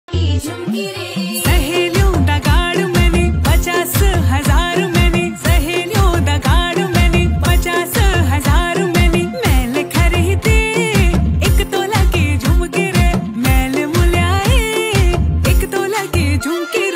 uttrakhandi ringtone

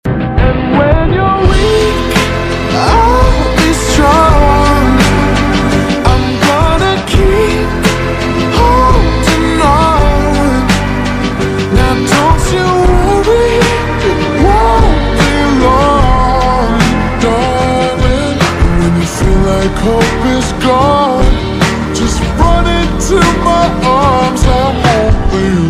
slowed english ringtone

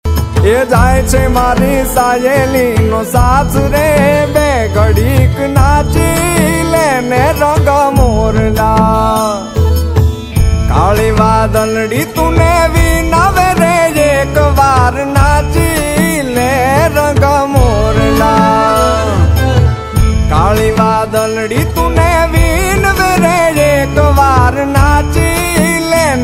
gujarati ringtone

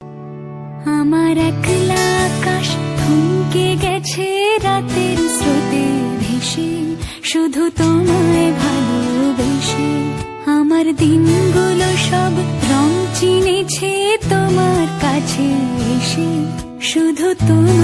Sad bengali ringtone

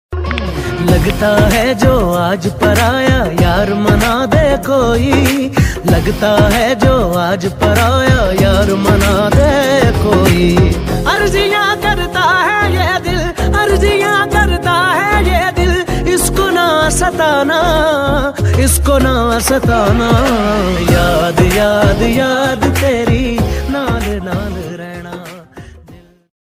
pakistani ringtone

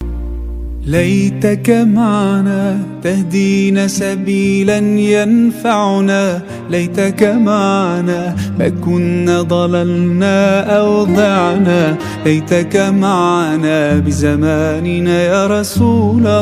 arabic ringtone

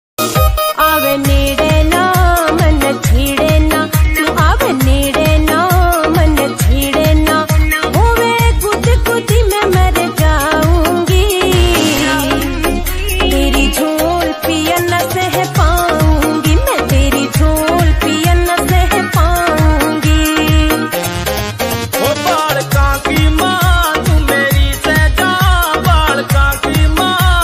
haryanvi ringtone

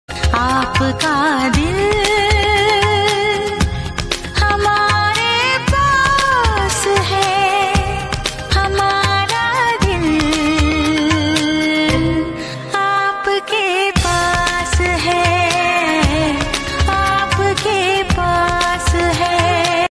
female version, hindi ringtone

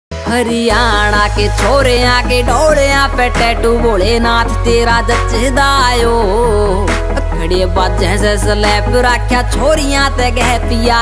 haryanvi ringtone

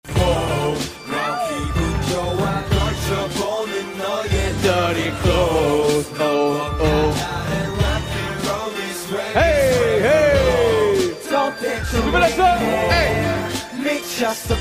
korean ringtone

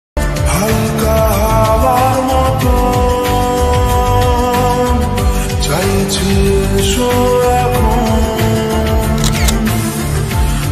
lofi bengali ringtone

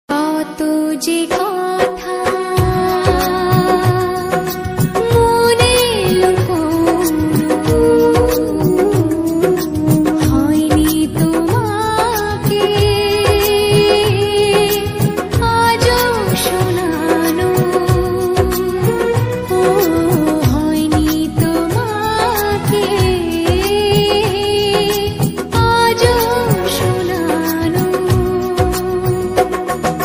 bengali ringtone

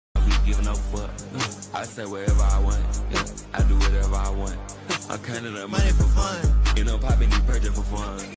slowed english ringtone

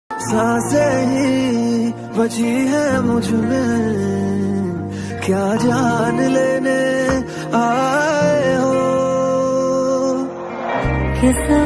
hindi ringtone

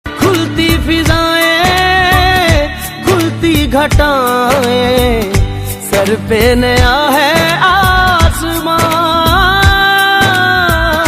male version hindi ringtone

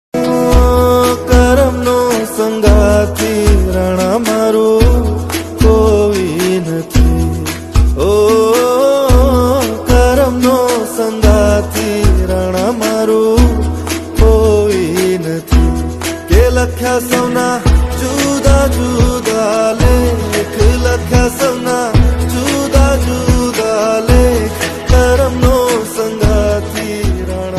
gujarati ringtone

